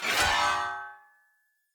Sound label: Great Sword Whoosh Metal; Weapons; Swords; Dendrit Ara Great Sword